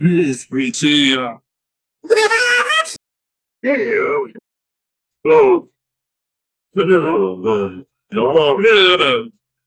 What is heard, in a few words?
a player fails to win, failure